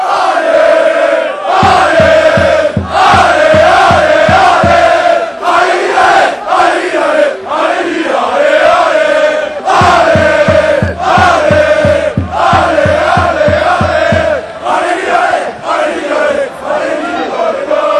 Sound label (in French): allez allez allez ajaccio, chants